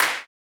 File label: Modern clap, Electro